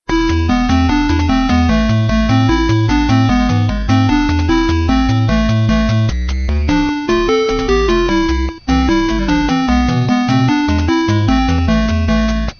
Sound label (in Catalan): musica tetris